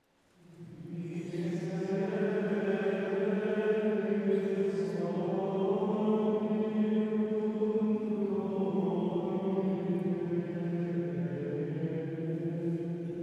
messe

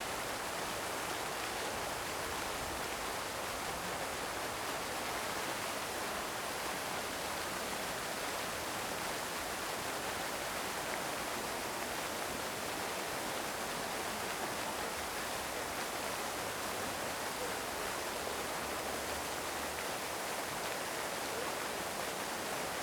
fontaine

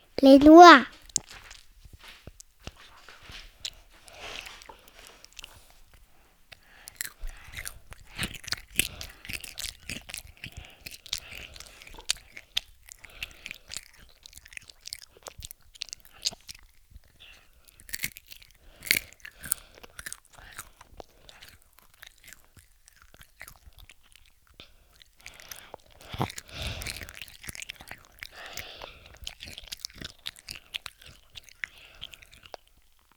noix

